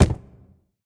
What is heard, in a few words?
phys cube, physics